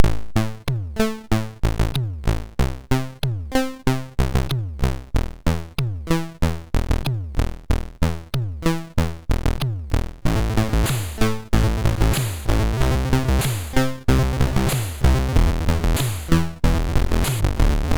Complex Beat, MOS6581R2; sid

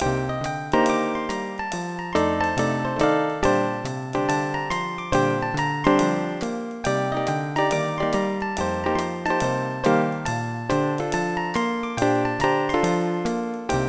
solo; Dr. T's MIDI Recording Studio

